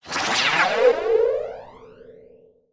Scifi Flare Whizz

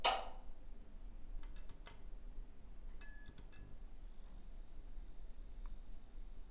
Switch

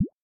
Item Hover

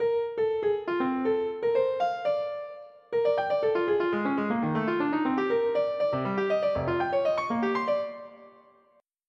Skeleton Playing Piano

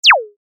Laser

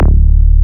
Psy Trance Bass D1

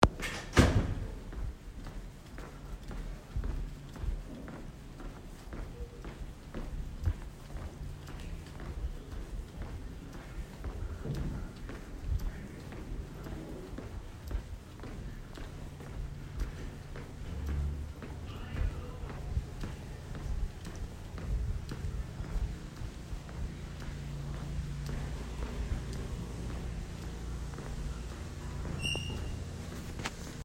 Hallway Ambiance

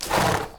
Horse Snort

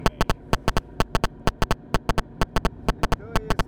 Mobile Phone Bleeps

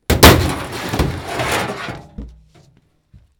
Metal Crash Heavy

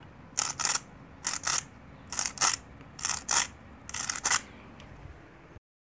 Salt Grinder

